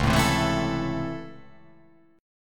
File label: C sharp Minor Major 7th Sharp 5th C sharp, chord